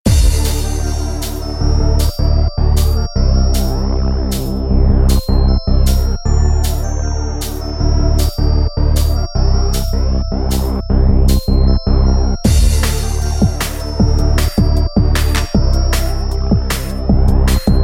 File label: Instrument; Windows 8